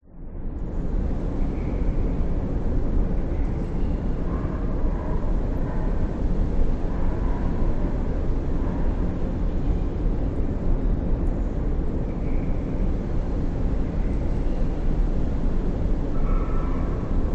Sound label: industrial warehouse abandoned drone wind outside drips distant dog